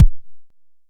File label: analog quick, Kickbank; Ableton Foundation FreePacks